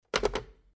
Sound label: Rotary Phone Pickup; Thanksgiving Special